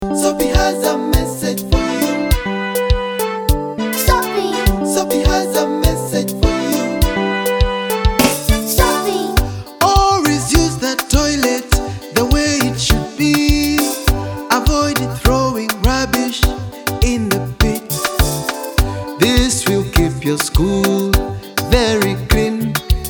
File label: Jingle Proper Toilet Use, campaign jingles; Soapy Saves Lives